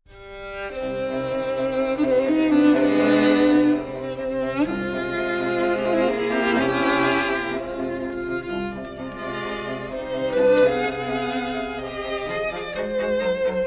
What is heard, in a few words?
beethoven strings; loudness normalized; 30sec; 11db; bakuage